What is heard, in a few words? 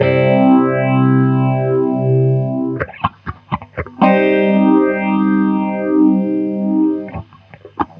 HEY GTR; USB Soundscan vol.32; Roots Disco; AKAI